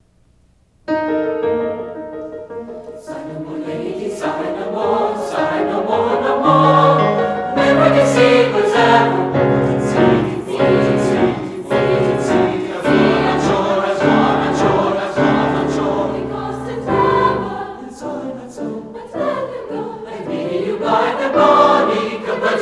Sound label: Three Madrigals, chorus